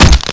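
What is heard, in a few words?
pipe wrench hit body; hl1 weapon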